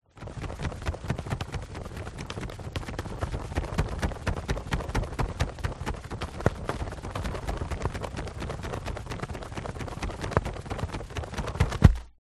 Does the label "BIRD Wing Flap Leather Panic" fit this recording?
Yes